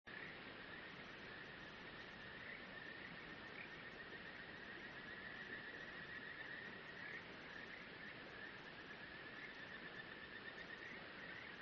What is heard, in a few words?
riverside